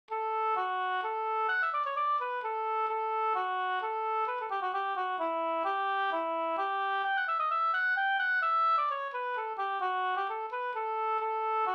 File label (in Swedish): hambopolska